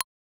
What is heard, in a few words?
click